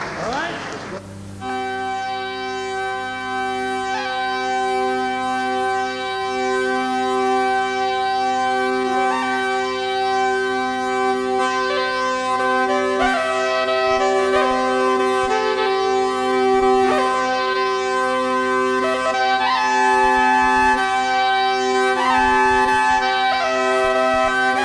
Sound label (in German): Dudelsack